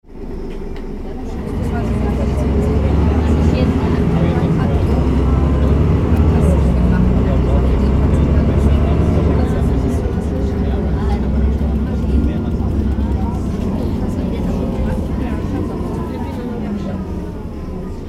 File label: bus cabin inside pulling out and stopping